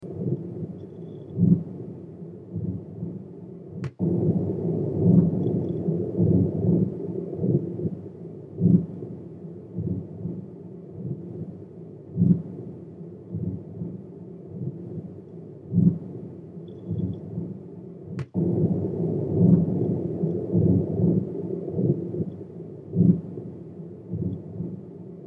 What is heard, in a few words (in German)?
Windrad